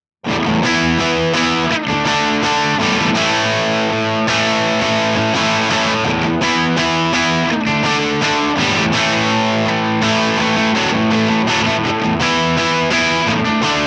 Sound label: crunch; jensentone